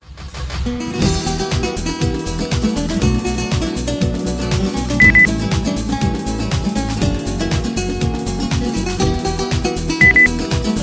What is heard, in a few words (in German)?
sol y mar beep